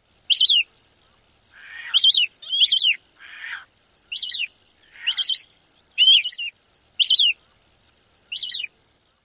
juvenile squeals

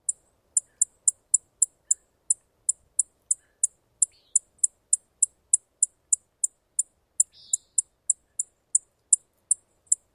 alarm chips

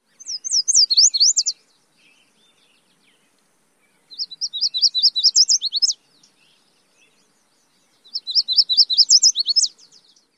songs, YELWAR

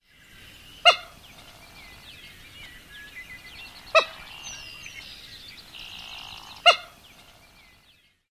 resonant keek calls

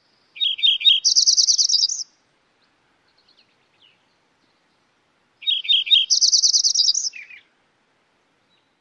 songs

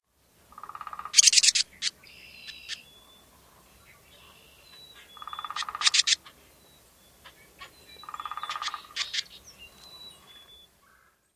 interaction call

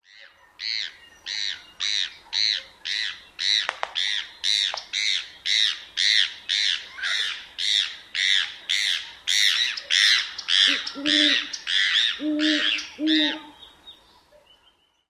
mobbing calls

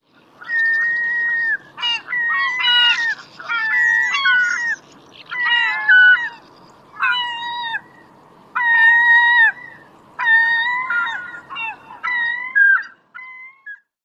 whining calls; AK

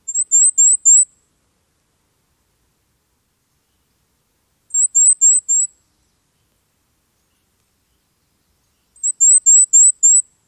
songs; NY

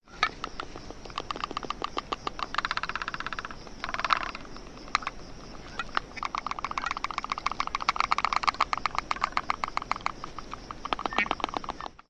bill snapping; MIDWAY ISL

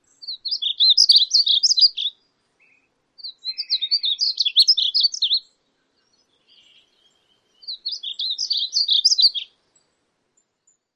song; TX

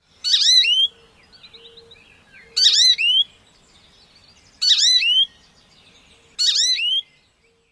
nasal call variant

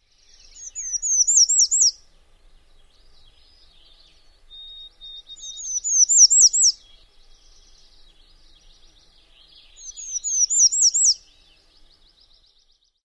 songs

